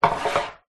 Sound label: wooden sliding toilet roll storage tower slide open or close